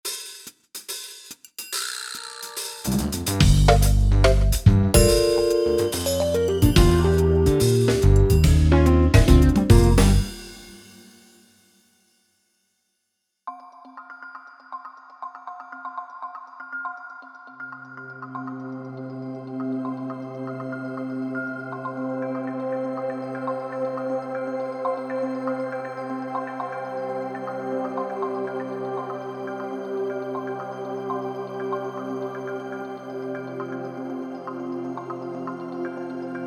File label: Ambient Soundscape; Jammin with Ableton 10 2